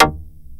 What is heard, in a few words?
TURBO ACID